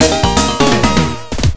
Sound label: Level Start; zool ninja of the nth dimension; genesis